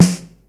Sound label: Snare Soft, Acoustic; Colombo Acoustic